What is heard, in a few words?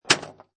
Pinball Sound Flipper Or Bumper With Ball; Video Game Sound Effects